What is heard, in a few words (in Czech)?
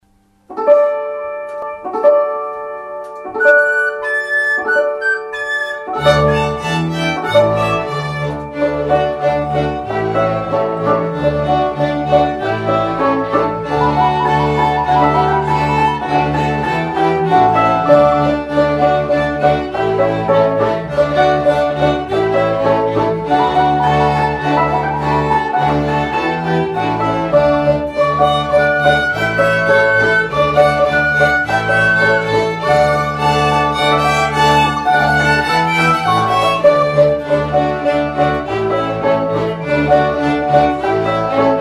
karaoke